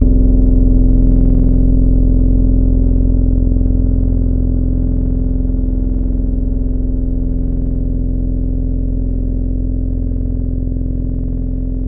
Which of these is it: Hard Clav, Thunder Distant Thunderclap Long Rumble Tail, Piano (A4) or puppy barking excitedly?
Hard Clav